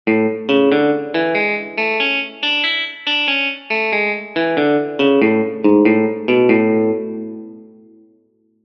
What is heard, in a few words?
ryukyu scale